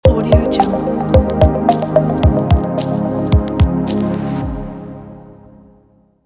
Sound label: soft light logo